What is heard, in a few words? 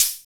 HH HH, Dance